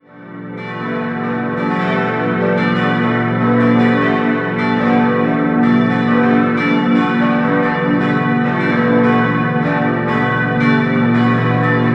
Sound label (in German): Erbendorf, Glocken